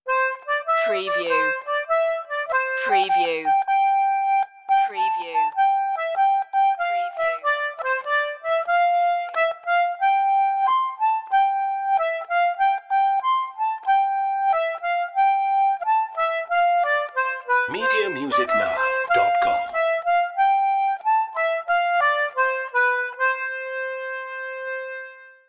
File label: solo concertina; here we come wassailing